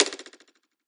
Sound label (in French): fleche